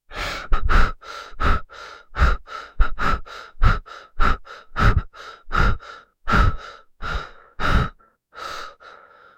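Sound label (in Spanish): inspiración nasal calmada, meditation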